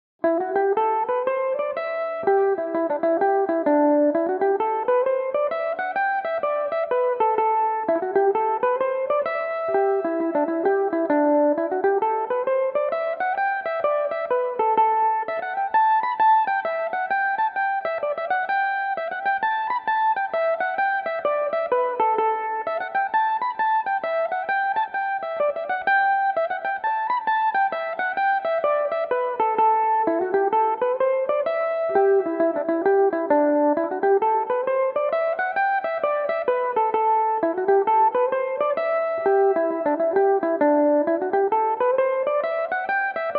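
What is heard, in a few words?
slide, A dorian, mandolin; maurice manleys slide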